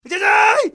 yayai

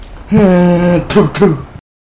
ahhh pewpew

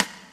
rimshot

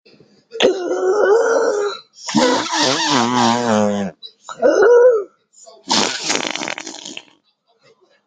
fart button

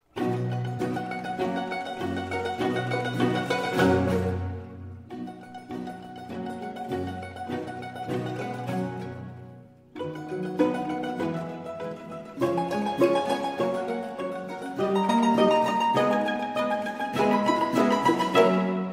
mandolin concerto in C major; RV 425

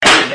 slap

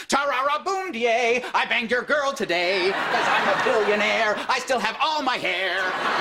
singing telegram

